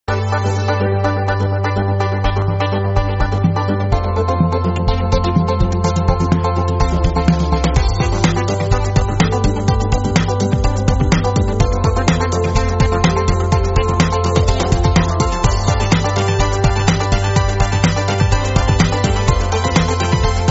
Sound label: Pop